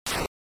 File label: NES General Noise Phrase